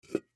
Soda Can Full Pick Up; Foley; Pick Ups; Objects; Blastwave

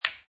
Billiard Pool Snooker Ball Hits Other Ball; Pool ball, snooker ball, billiards ball; Sports; LynneMusic

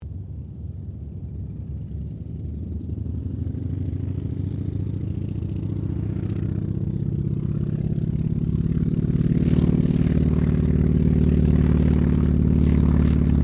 Ceres Winjeel Wirraway takeoff; Mixed aircraft squadrons; Airplanes; Vehicles

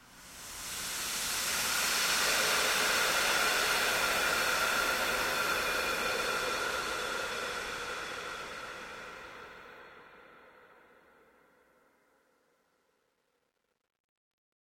Swell Airy Toxin Steam Release Long; Sound Design

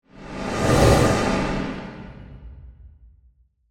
Reverse Whoosh; Production, Element, Riser, Flange; Ascends; Sound Design

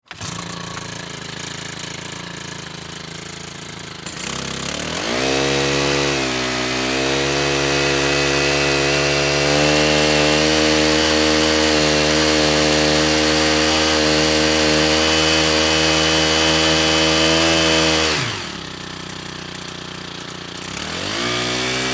Industry Hedge Trimmer Operate On Run Off; Weed Whackers

